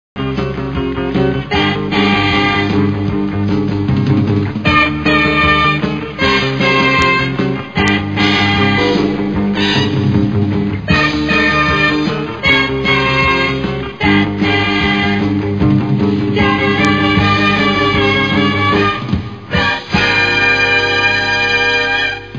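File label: allied battle win; ambience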